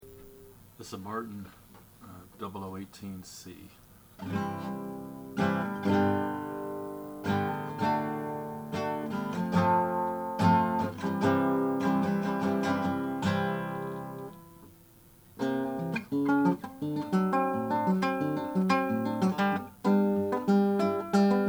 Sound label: martin 00 18c natural